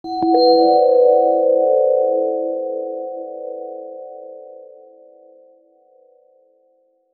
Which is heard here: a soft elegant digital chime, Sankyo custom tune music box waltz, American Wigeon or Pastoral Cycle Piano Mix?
a soft elegant digital chime